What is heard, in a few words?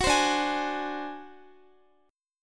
D 7th Flat 5th, Guitar; strummed; x,x,16,17,14,15; 4E,4A,5D,5G,5C,6F; chord